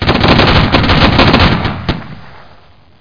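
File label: weapon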